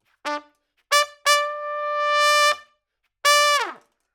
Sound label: Trump